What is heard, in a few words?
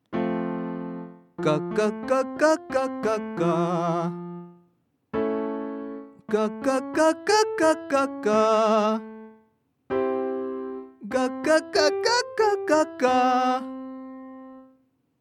mixed voice consonants